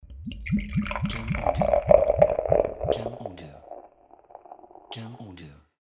Pouring Beer

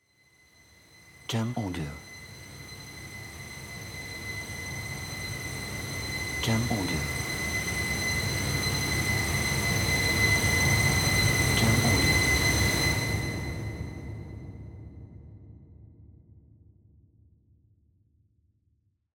Space Rise

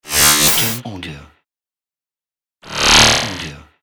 Glitch

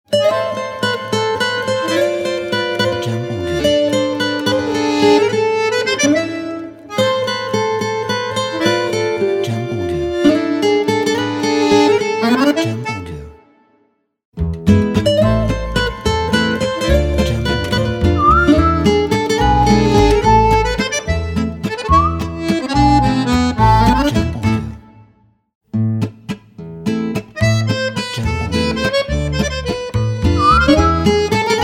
Accordeon Paris Logo; Accordeon Paris Logo Pack

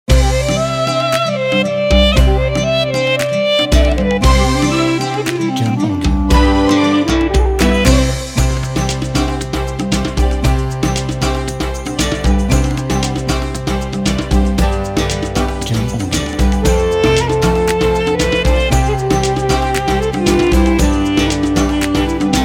Middle Eastern Arabic

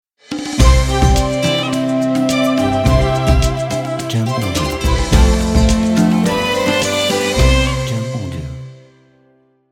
Arabic Logo

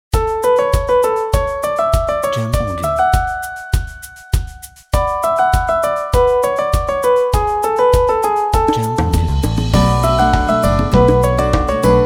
Positive Happy Logo

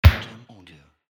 Punch

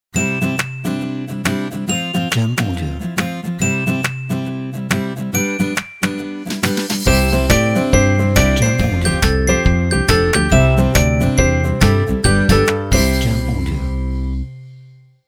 Happy Positive Intro Logo

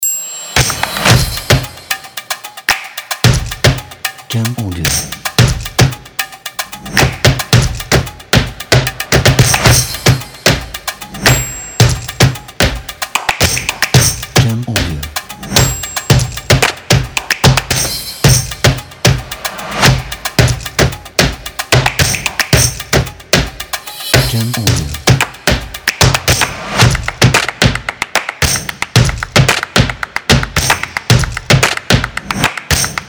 Percussive Action

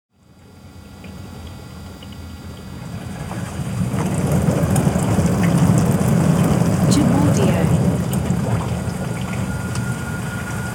Dishwasher

